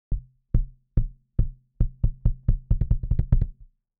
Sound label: kick; SSL X Gate; preset Kick Short